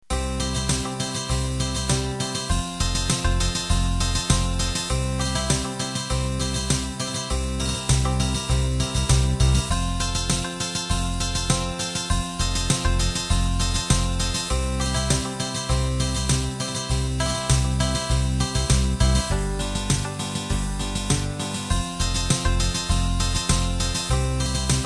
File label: Cajun Country